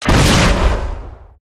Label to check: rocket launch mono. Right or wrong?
Right